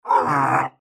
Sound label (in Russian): krik dinozavra